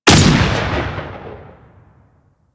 Dual Pistol Shot 3D; Grn Queen Guard Dual Pistols

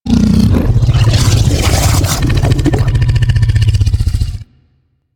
Vocal Idle, critter; Warframe; Hunting Critters; Undazoa

